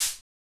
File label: Modern hihat, Electro